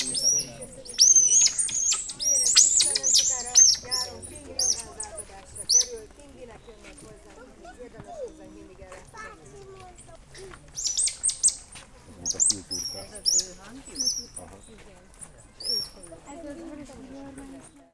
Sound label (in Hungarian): aktívak, ormányos medve; szegedi zoo 2011